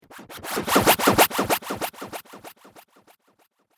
Bouncing Laser Chaos

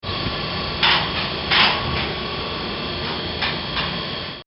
Garbage Truck; Household; Bobby Cole

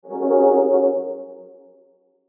Sweeper Reverb Flutter; Production Element Imaging Element Accent Transition; Sweeper Sound Effects

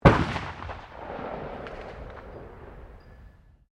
RIFLE UNKNOWN FIRING DISTANT; Rifles, non military; Guns

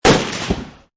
AK47 Shot; Assault Rifles; Guns; Partners In Rhyme

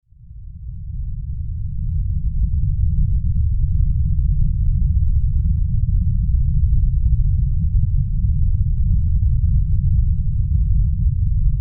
Weather Earthquake Rumble; Rumble Sound Effects; Rumbles (Sound Effects:Sound Design)

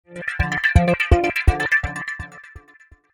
Synthetic Melodic Heartbeat; Miscellaneous Cartoon Sound Effects; science fiction sound effect; Andrew Fleming

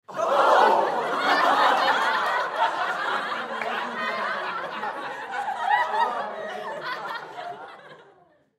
Human Crowd Studio; Crowd Reactions Laughter Claps; Blastwave

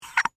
Bolt Tighten Single, Screw, Squeak; Machinery; BlastWave

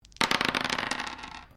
Dice medium pair laminate table throw; Casinos; Variety of dice sounds

